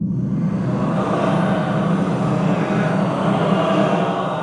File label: fiends grip target; weapons; dota; bane